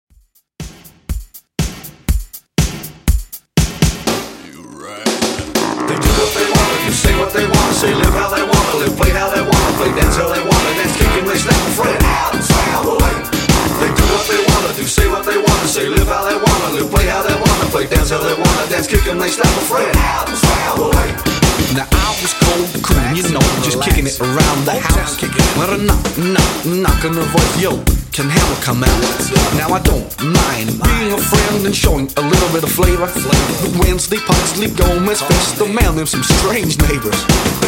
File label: Halloween ReDrum, 121 Bpm